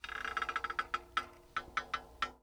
Cartoon Spring Creak

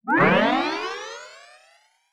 Multimedia Internet CD-Rom Flash Wipe Cartoon

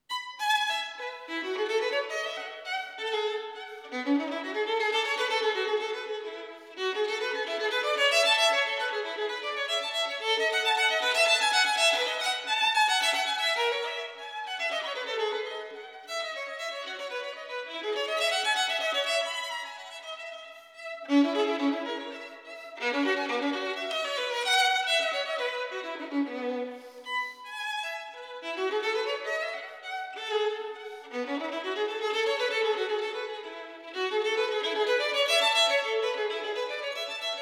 Gigue, Solo; Yuval Yaron; J.S.Bach - Sonatas and Partitas for Solo

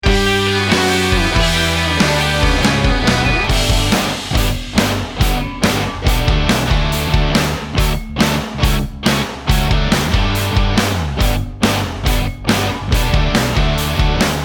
Intro Outro; Rodecaster PRO

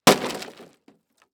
Impact Wood Crash Short

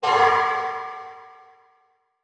Transition Metallic Hit

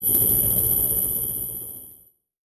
Multimedia Internet CD-Rom Flash Animation

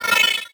Digital; Adobe; Multimedia Internet CD-Rom Flash

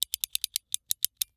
Emergency Handcuffs Clicks; emergency effects; AdobeCC

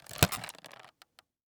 Electronic Portable DVD Player Opening Fast; AdobeCC

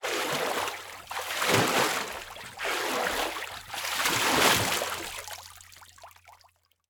Liquid Water Water Splashes Mulitple

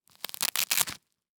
Foley Velcro Unfasten

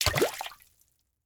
Liquid Water Water Foot Stomp In Water

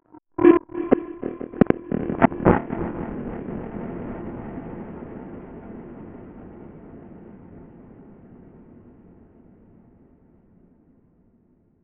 Title Transition Communication Distortion Radio; Production Element Title Transition

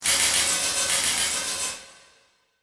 Title Transition Electrical Pulse; Production Element Title Transition

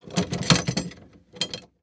Foley Padlock Clink

